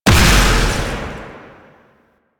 Explosion Small; Duviri; Incarnon Adapters

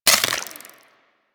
Mech; Uzi; Warframe; Infested

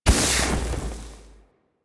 Immolation Extinguish; Warframe; Ember

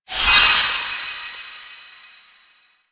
Bleeding Shards Cast; Warframe; Citrine

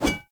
One Handed Axe Whoosh

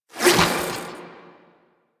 Whip Spin Whoosh; Warframe; The New War; Archon; Nira

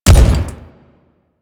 Step Default; Warframe; Grineer Ghouls; Ghoul Devourer; Footsteps

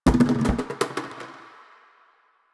Plastic Hit

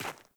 Sandals Run Rock, Footsteps; Characters; Player

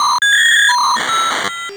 Hack Minigame Stop; Entrati

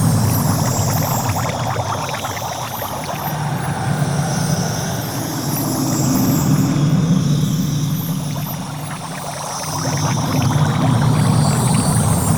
Fishing Action Loop; Duviri Maw Fishing

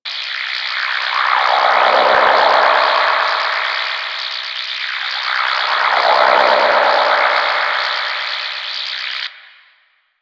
Space Portal Connector Loop; Warframe; Ambience; Space Battles

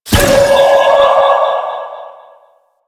Spirit Primary Fire E; Warframe; Grineer Thumper Bot; Sentient Spirits

